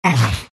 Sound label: big pain; Undying